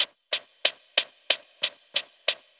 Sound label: SHAK; USB Soundscan vol.47; AKAI; Hip-Hop & R&B